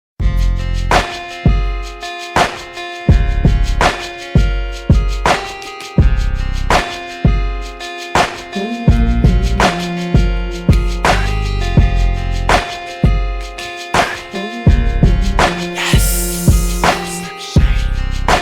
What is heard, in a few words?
instrumental